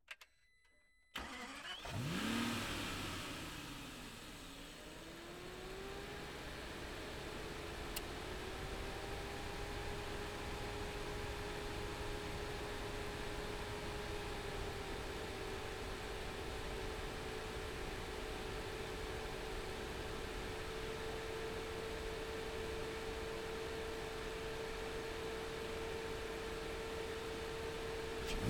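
Aston Martin DB9 Onboard Start Idle Blips Steadies in Neutral Off Engine Right; DPA4062; Pole Position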